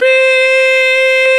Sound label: VOX, Male Scats